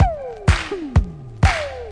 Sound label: disco linn and claps, drum loops and breaks